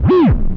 dog playfull; hl2

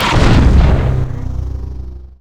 superphys launch, physcannon

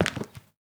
concrete, footsteps; Sourceforts2.0

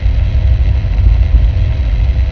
portal beam loop; hl2

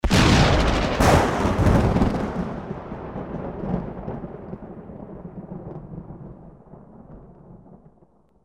thunder; Sourceforts2.0; rainthunder

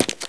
hard boot generic, footsteps; hl2

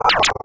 mine chirp answer, roller; hl2

